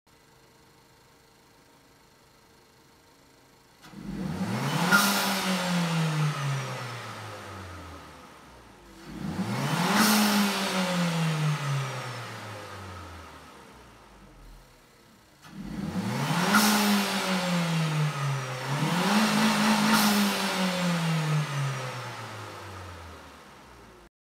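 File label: Talisman, car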